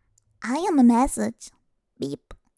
message beep

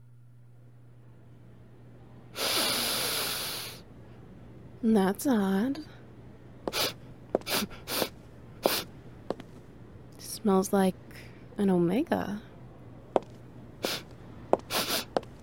SFX No Wet Noises; Big Bad Alpha

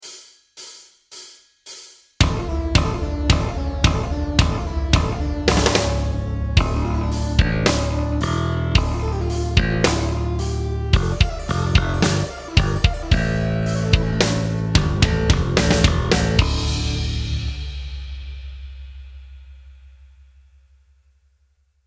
sweep arpeggio bursts backing track

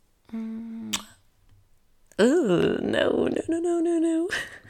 kissing bloop

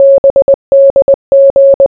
morse

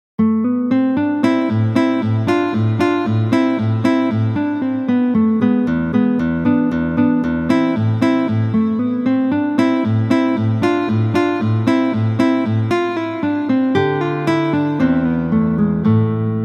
Allegretto In A Minor

